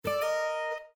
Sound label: Afternoon Exclamation; Windows 7